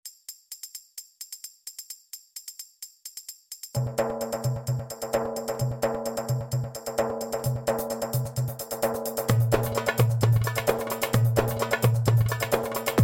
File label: Minus 1 With Guied flute